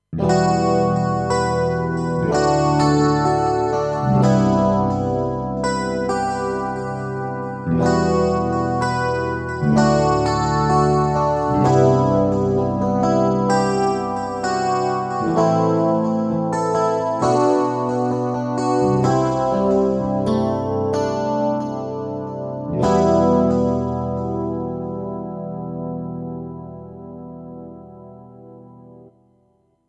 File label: Hybrid 1 Coil Gtr